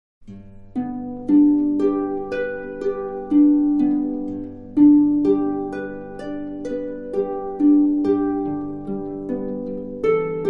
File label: harp